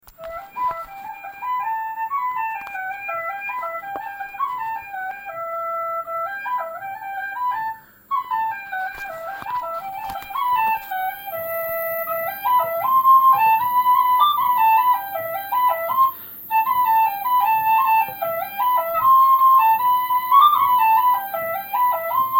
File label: Trip to Pakistan